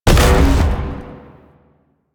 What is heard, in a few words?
Void Dragon Beam Shot; Enemies; Abilities; Warframe; Duviri